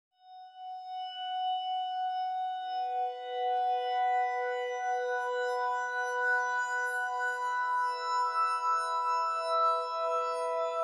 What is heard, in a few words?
crystal morph